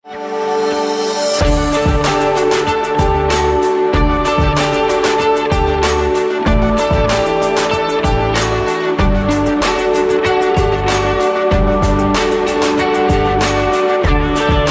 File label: Corporate